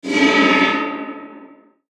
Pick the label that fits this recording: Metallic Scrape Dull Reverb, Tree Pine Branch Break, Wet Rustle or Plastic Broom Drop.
Metallic Scrape Dull Reverb